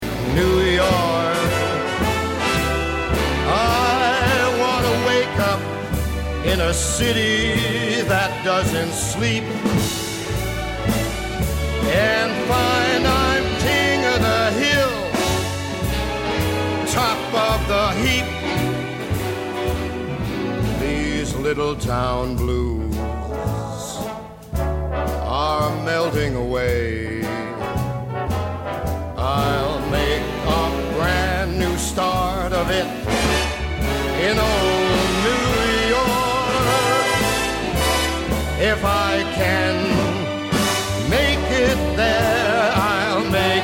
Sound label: Vocal Guide